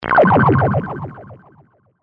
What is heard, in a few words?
Pulse Ray, Scifi; Science, Fiction; Battle